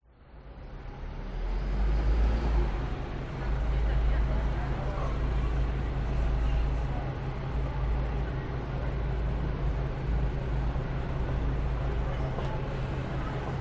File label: Bus station ambience; west wolf